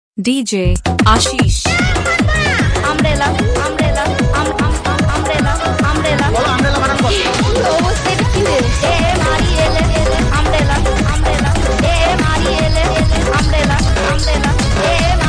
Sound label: funny song